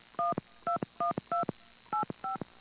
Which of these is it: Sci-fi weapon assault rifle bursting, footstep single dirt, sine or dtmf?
dtmf